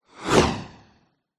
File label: science fiction spacecraft fly past fast; zapsplat